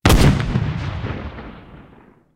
aa 37mm fire, guns; War Thunder

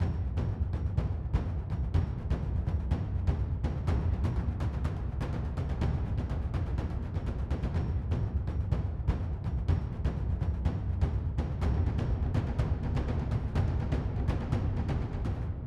music interactive; World of Tanks

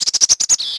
birds; World of Tanks Blitz; hangar 9 may

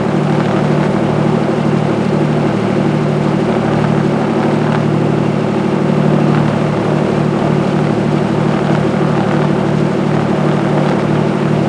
plane; World of Tanks

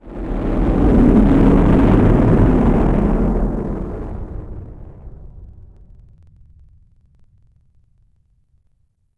ICBM Run; Civ4 warlords

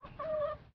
sleeping; Rust

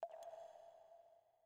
water drip; Rust

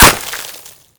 Projectile Sounds; Red Orchestra Ostfront 41-45

